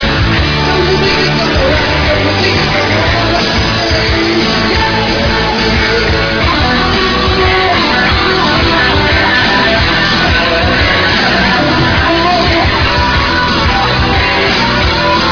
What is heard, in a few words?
4-string bass solo